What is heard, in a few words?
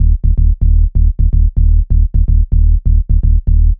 Rolling Synth Bass, D-, 126